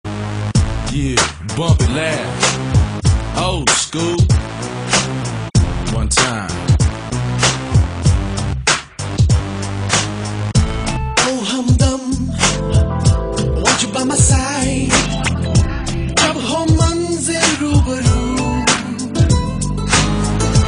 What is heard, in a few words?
Song Reggae